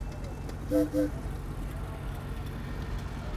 whistle

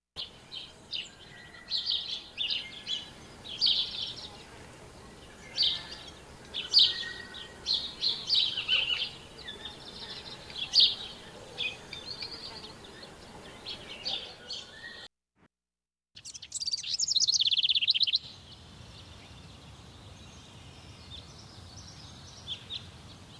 Nature ambience - birds wind

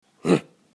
angry grunt

